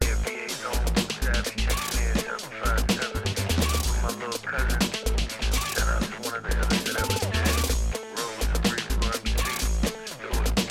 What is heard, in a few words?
TRAP BASS